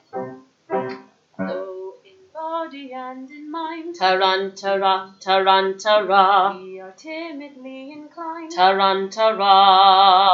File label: low police